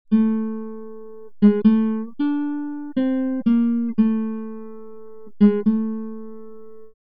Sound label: guitar deterministic